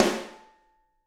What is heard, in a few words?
SD, Drums; Bob Clearmountain Drums I